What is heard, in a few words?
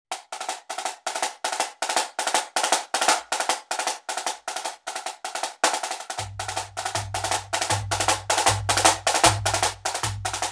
Drum Salute